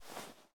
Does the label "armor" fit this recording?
Yes